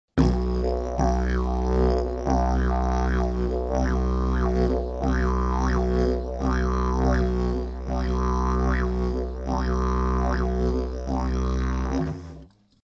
didge circle breath

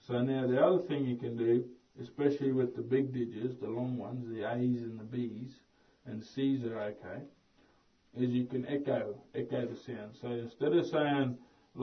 Didgeridoo teach

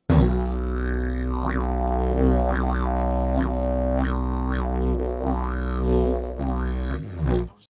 didge timbre modul

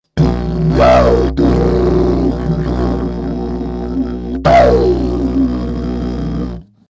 didge high noises

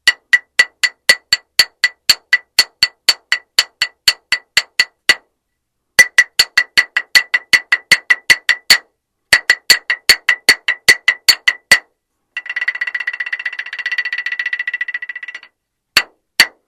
clapsticks

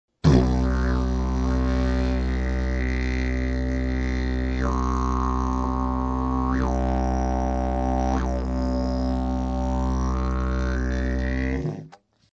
didge rich

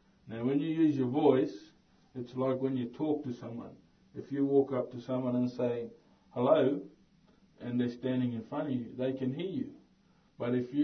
Didgeridoo teach

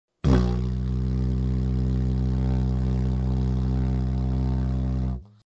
didge dull

